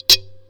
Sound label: Djembe cheap mic session